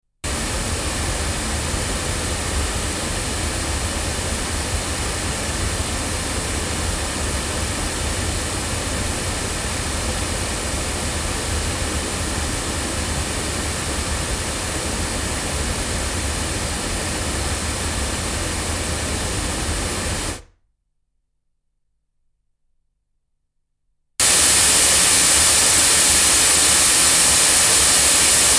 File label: Sontronics Helios cardioid loudspeakers, microphones recordings